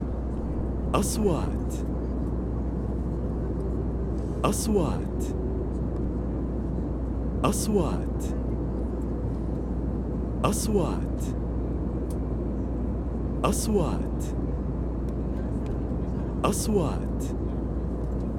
الصوت داخل رحلة الطائرة, airplane sky wind

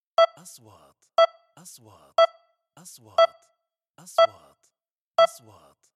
نغمة تنبيه تنازلي; transitions

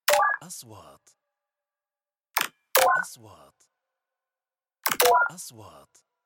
نغمة حركة الكترونية; transitions

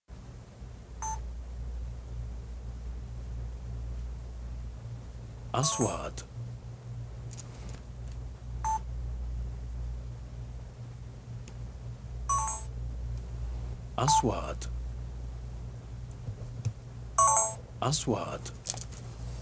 صوت تسجيل الكاميرة; sound effect; shooting

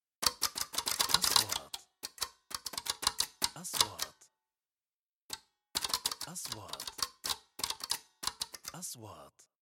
نغمة كيبورد معدن; transitions

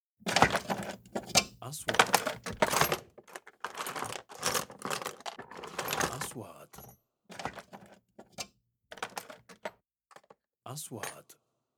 معدات نجارة وادوات مشكلة; sound effect

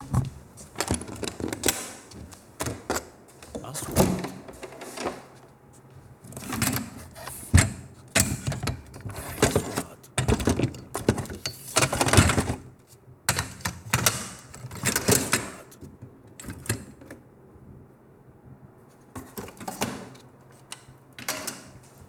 صوت صندوق معدات التصوير; sound effect; shooting

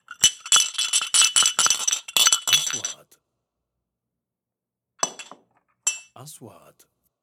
صوت كاسات زجاج; sound effect

